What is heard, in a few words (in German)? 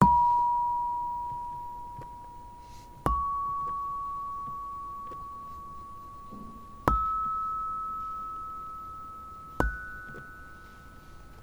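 Saron klein leise, gamelan; Indonesien; HB-Samples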